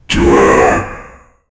demon chanting to battle

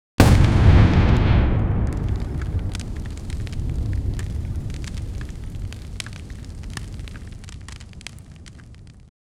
surrounding fire crackle

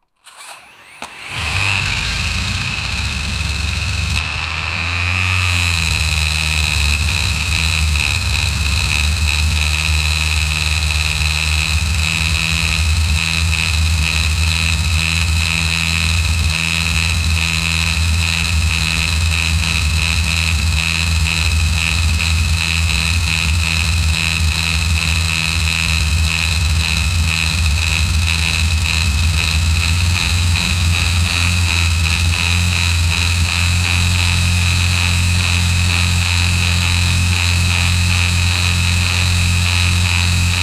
hard rev limiter v10, automobiles; dual

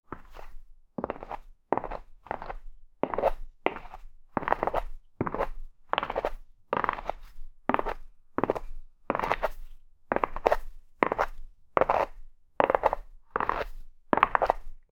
walk on ground, creatures; pokemon

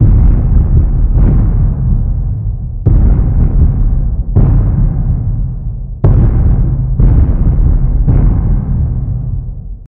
epic battlefield ambiance

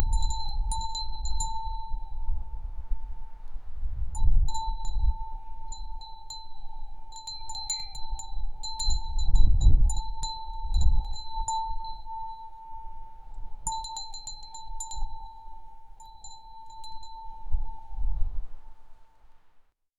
wind bell calmly rin, wind chimes

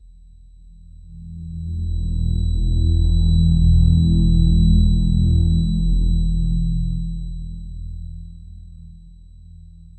a short impactful sound, low frequency hum

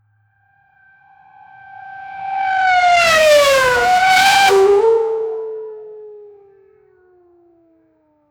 a recording of a porsche, sports car

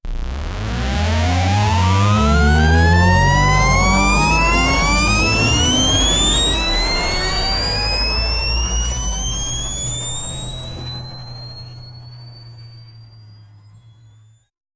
high tech energy shield charge up

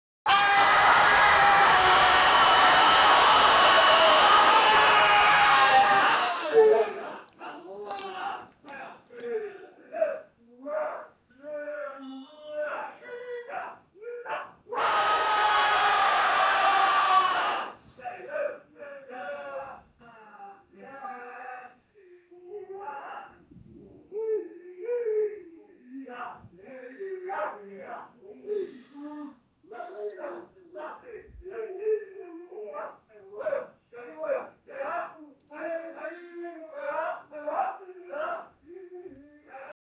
the village is burning, chaos

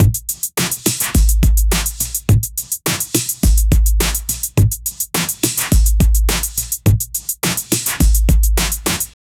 dj beats from the 1990s

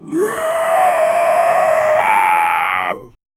zombie attack low scream

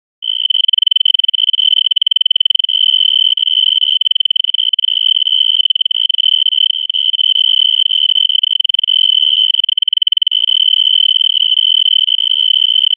wiping sound like lightning

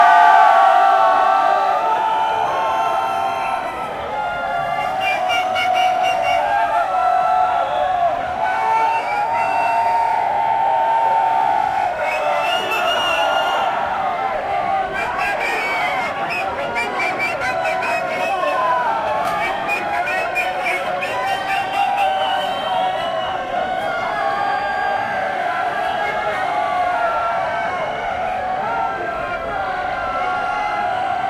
festival crowd cheer indi

